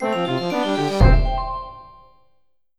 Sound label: new level